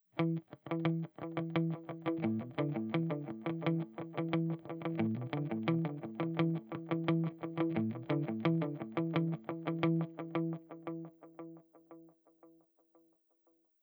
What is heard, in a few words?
delay